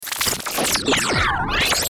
Stinger Frequency Warble, Stinger Frequency Sweep Digital Warble; Stingers